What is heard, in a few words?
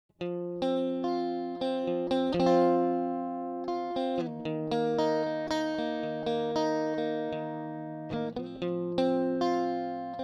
Necklace Guitar